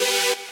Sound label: saw